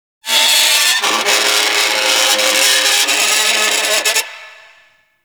Forest Metal Squeak, Ambience; Warframe; Grineer

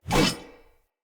Short Sword Whoosh; Weapons; Enemy; Swords; Short Sword Elite

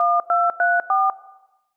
Ring Tone

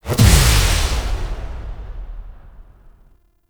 Grimoire Alt Impact; Warframe; Tenno

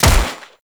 Enemy Fire 3D, Weapons; Gotva Prime

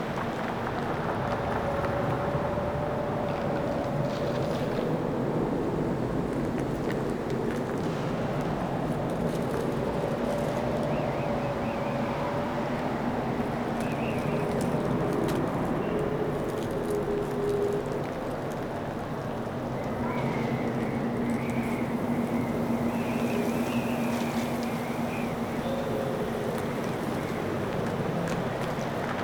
Outpost Defense Ext Amb; Warframe; Corpus

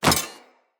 Cleansed Spear Catch; Weapons; Player

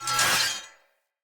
Melee Whoosh Accent; Weapons; Swords; Dendrit Short Sword; Vetch

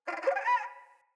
Trapped Fawn Call; Encounters; Animal Rescue

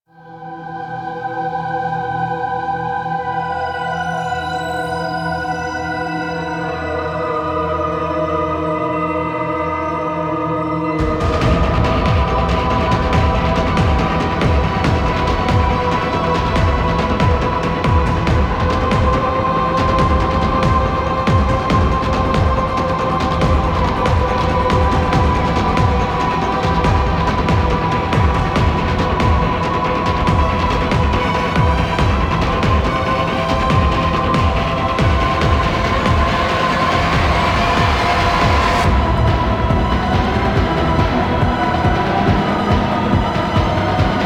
Good Ending Cue; gameplay; Warframe; Nineteen Ninety Nine; Stage 11 Future Past

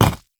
Footstep; Warframe; Qorvex

